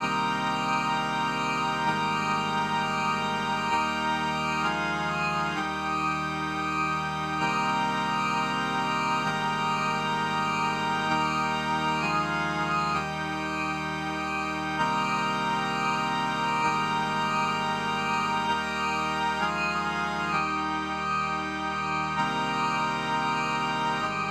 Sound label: mono pad wide phaser